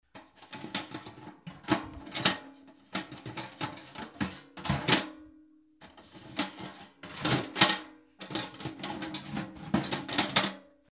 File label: bucket